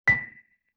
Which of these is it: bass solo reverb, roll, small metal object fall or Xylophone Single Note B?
Xylophone Single Note B